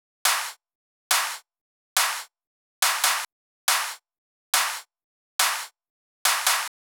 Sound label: drum Loop 140BPM Clap; Vengeance - Essential Clubsounds Vol.5; VEC5 Drumloops; VEC5 Multi Loops 140BPM